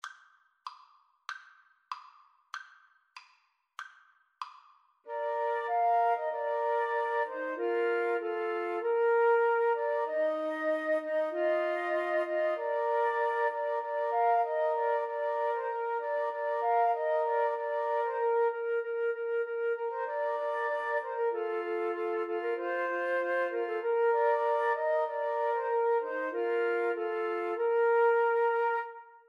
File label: joy to the world, Flute Trio